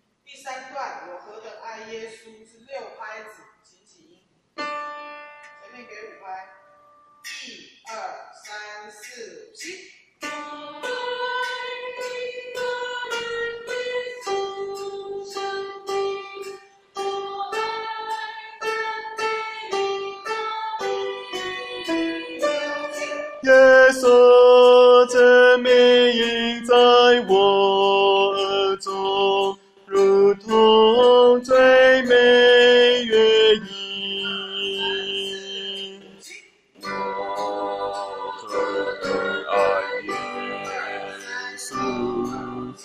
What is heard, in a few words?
bass